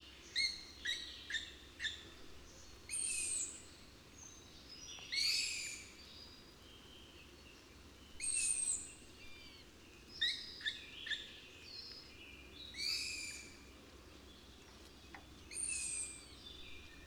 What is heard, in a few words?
Long eared Owl Juvenile barking begging calls